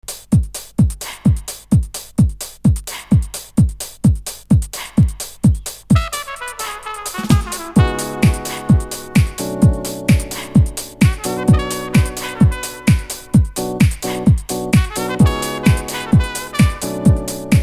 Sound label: Deep House Classic